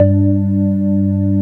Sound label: GRINDER G2, FARFISA; Greytsounds